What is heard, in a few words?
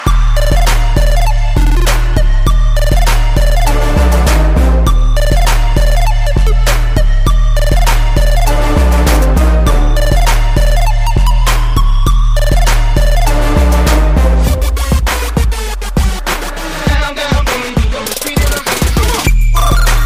Perfect Tone, Mobile